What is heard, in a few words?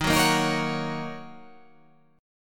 D sharp Minor 7th